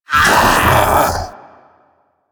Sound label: Infested Splitter Joined Vocal Alerted; Warframe; Grey Strain